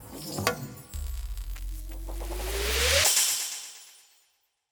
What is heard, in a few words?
teleport; Combat